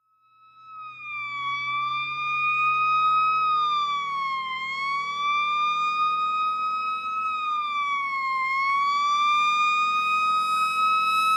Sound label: Fire Truck By Fast